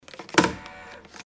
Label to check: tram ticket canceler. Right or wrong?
Right